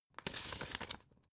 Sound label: old put down